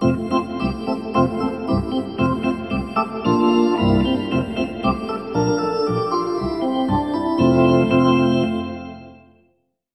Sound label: Hockey Organ